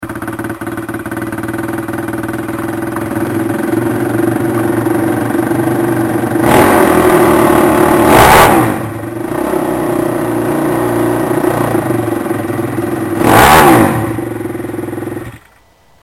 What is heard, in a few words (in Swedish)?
4takts racer; Husqvarna; Peters